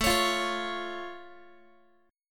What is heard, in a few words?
A Augmented A down Guitar; A+ strummed; x,17,16,15,17,x